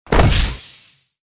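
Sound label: fast magic attack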